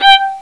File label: fiddle, string instruments; Sound Effects for Multimedia - Volume 1